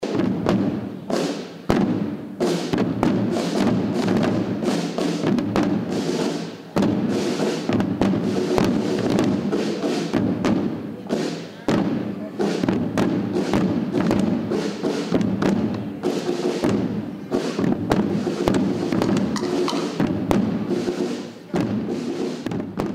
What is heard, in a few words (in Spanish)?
toque tambor; 7 palabras